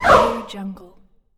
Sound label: wounded female game monster